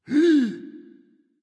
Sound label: nv breath, vox; smite; Vulcan